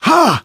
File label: shoved, survivor voice, manager; source engine; left 4 dead 2